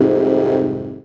Fm Dub Chord

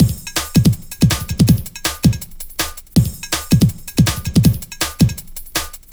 Bright, Loops, breaks; m8 breaks

